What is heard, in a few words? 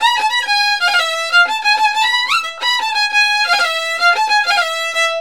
VIOL L, Balkanish Traditions; USB Soundscan vol.63; AKAI